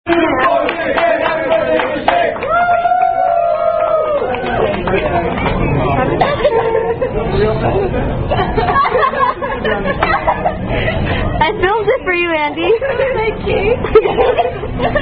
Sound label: Happy Birthday, Here's Your Shake; Chili's